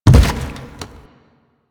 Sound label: Gas Tank Impact; Warframe; Nineteeen Ninety Nine; PvPvE